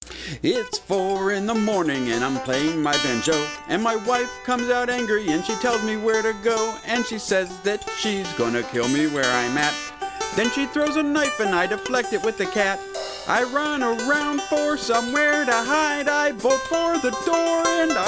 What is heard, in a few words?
Banjo Lullaby